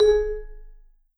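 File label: VIBE SHORT MF G#3, Vibes; VIBE SHRT MF; Marimba & Vibes; Sampleheads; Dave Samuels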